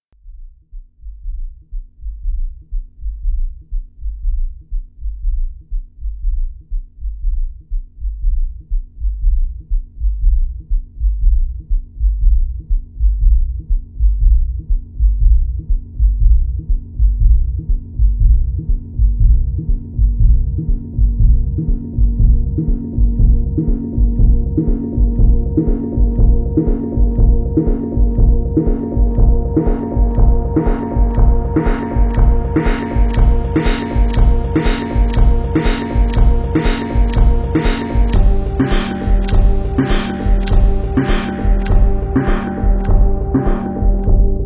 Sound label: Akai S3000XL Low End